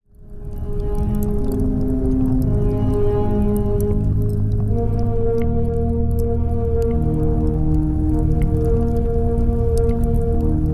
Lava Planet Music and Atmos, Lava: distorted cello, fire, bubbles